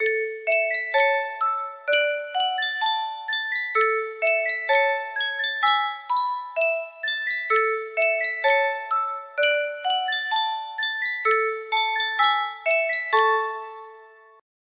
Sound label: music box